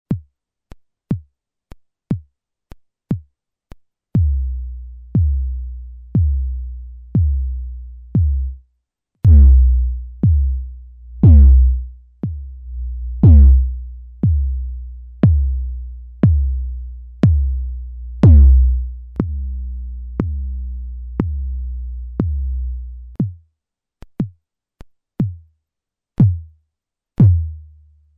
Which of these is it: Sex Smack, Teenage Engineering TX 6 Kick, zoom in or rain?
Teenage Engineering TX 6 Kick